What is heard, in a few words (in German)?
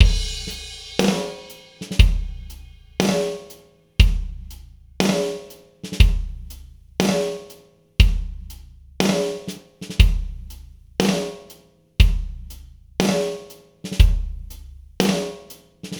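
Gate-Reverb Drums, Echo-Cluster, ähnlich einem Slapback-Delay, jedoch etwas breiter aufgestellt; Eventide Tverb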